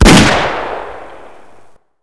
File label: pistol